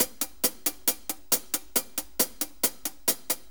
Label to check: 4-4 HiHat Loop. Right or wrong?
Right